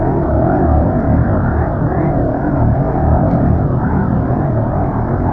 slowfield loop, grenades

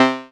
death beep; player

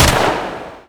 tommygun fire; weapons

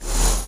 suit charge ok

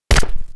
sniper hit, bullets; weapons

